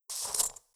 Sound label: water rudder out, c172p; fgdata